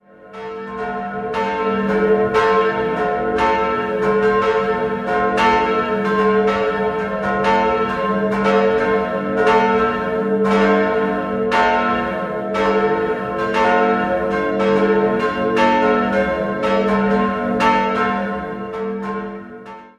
Kirchdorf im Wald, Glocken

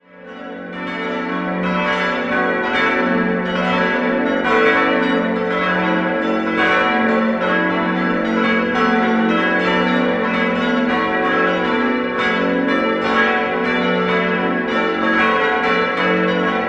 Buchbach am Wald, Glocken

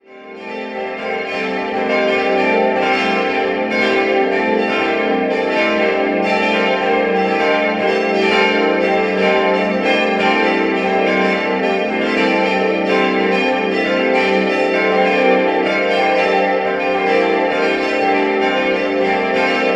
Nürnberg Thon, Glocken

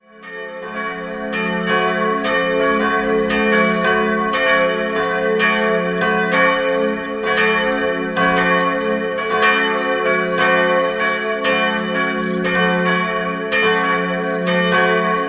Burgsalach, Evang. Kolomanskirche, Glocken; Glocken wurden 1950 von Karl Czudnochowsky in Erding gegossen, die kleinste stammt noch aus dem Jahr 1923.

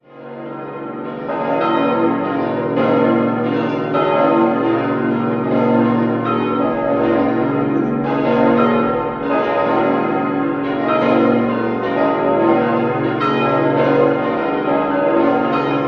Ettal, Glocken

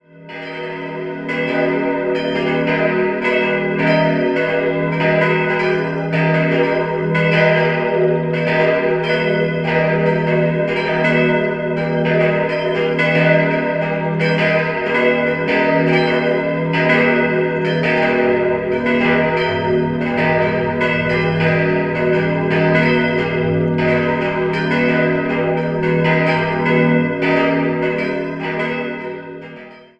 Bad Gögging, glocken

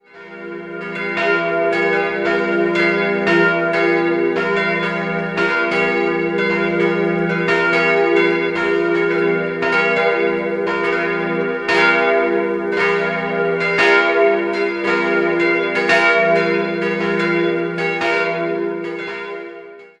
Brunnen, glocken

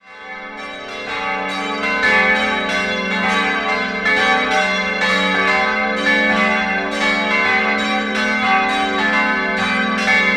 Nürnberg Schniegling ev, Glocken

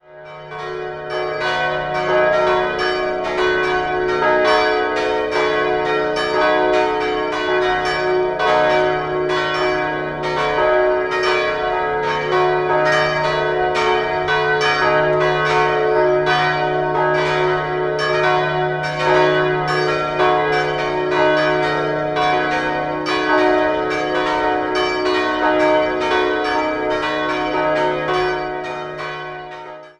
Vierkirchen, glocken

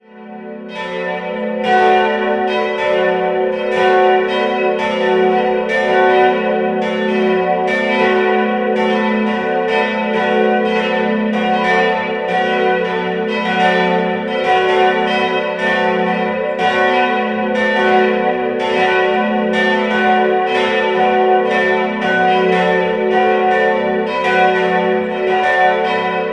Nürnberg-Gebersdorf, Pfarrkirche Heilig Kreuz, glocken

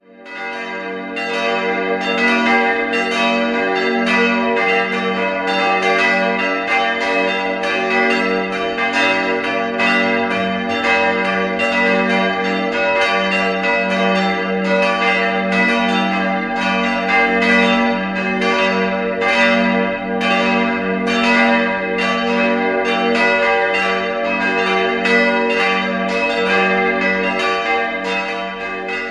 Gelnhausen Peter, glocken

